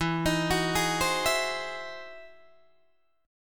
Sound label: E Augmented Major 9th, Guitar, Open D